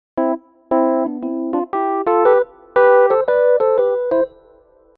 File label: Jingle